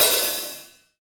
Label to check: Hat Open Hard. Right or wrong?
Right